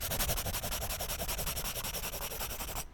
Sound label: Write